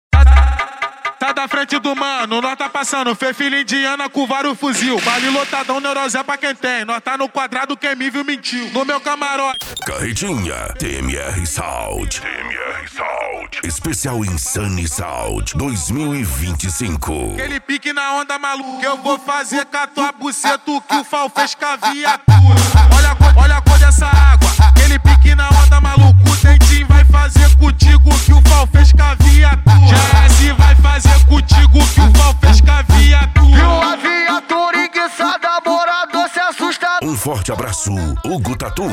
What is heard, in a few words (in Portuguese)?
esp insane sound